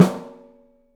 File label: OPEN STEEL SN; 3D Drums